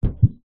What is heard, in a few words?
vehicles car bonnet hood pressure press hit bend footstep single